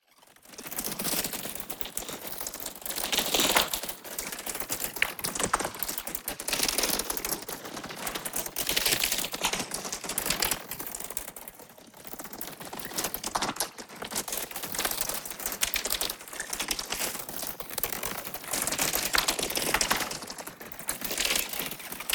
PARTICLES Squeaky Current; boom library; Matter

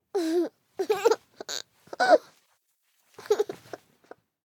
BABIES LAUGH Girl 24 months Giggling Moving Close; Baby Boom

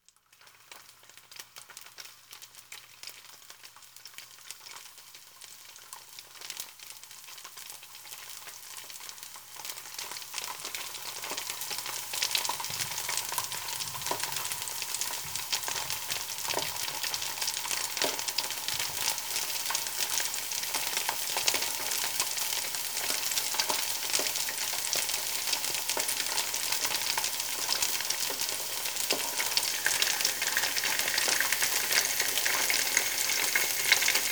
Household Phones

